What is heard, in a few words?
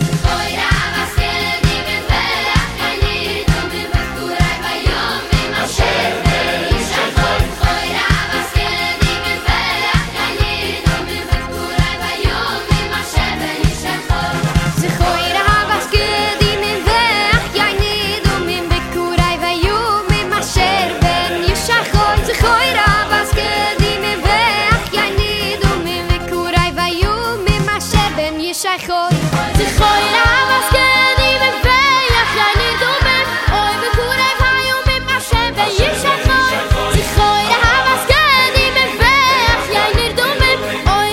choir, jewish music